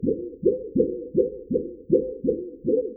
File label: Wobble board heart beat